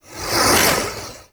Whip Ranged Attack Projectile; Warframe; The New War; Archon; Nira

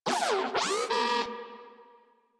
Moa Compassionate Vocal Enemy Spotted, Pets; Warframe

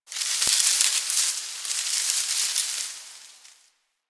Foliage Rustle; Ambience; Warframe; The New War; Quests; Zariman

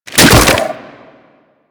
Grapple Throw; Warframe; Infested; Ancient; Attacks

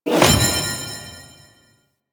Shoot Switch Activate; Ambience; Orokin Moon; Warframe

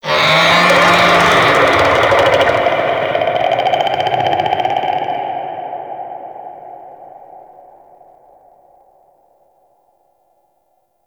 Infested Golem Vocal Attack Short; Warframe

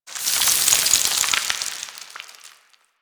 Rock Crumble; Ambience; One Offs; Warframe; Quests; The New War; Drifter Camp

